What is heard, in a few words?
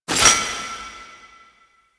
Citrine Crystal Pickup; UI; Pickups; Warframe